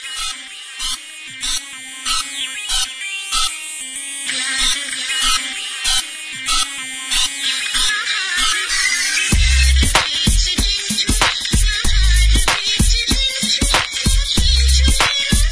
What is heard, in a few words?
Nepali